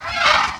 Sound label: hurt; mahlendur